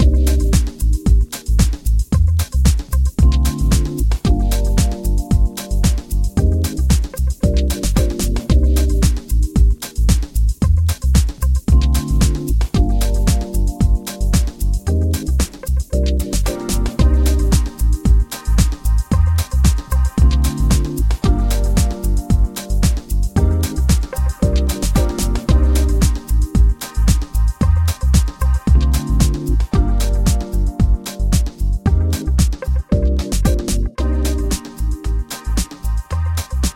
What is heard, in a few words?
Easy house background